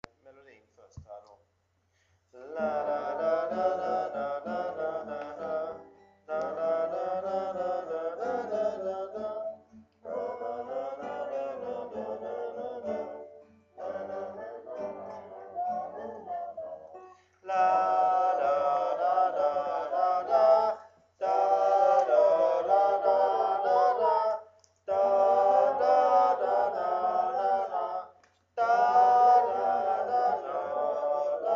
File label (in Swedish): Folkdanslat D-dur Sopran; Ronneby Folkteater